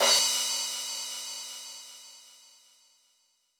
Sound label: Roland U220 Dry Set